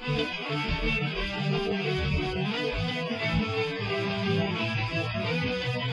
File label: Groove